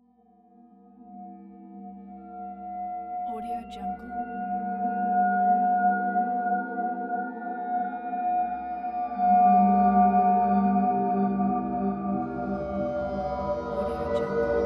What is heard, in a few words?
horror soundscape